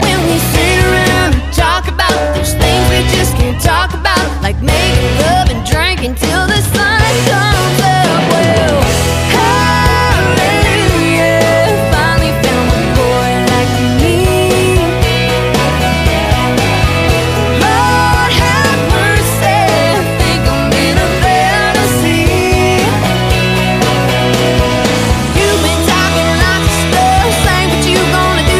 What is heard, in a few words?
harp